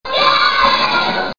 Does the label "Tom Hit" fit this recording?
No